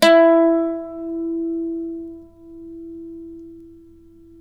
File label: e3, Mandolin; Guitar; Terkelsen